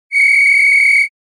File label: whistletone